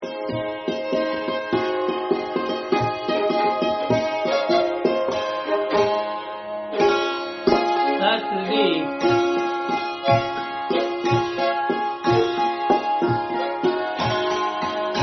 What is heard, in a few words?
Chording